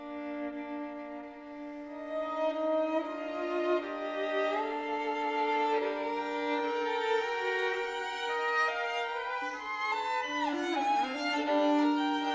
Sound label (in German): Fantasie 2 Violinen; Aus 'Nouveautés' - Sylvia Reichardt und Holger Grohs - Violinen